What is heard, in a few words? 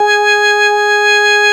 ORG Vox G#, Continental Vox Wah Bar; Roland LCDP09 Keys of the 60s and 70s 1